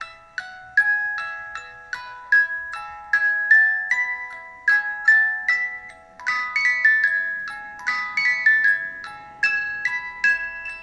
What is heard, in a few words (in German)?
Spieluhr Bruder Jakob